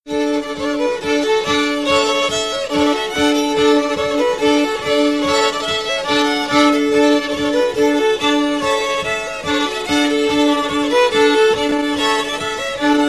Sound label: polka; lietuva; ringtones lituanos